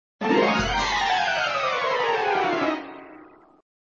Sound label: Music Fall music (mine cart), flipper; Indy